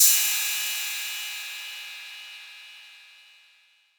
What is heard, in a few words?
crash cymbal single shot f key